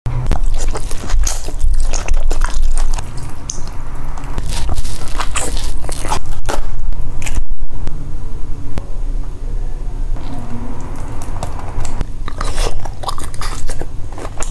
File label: eating crab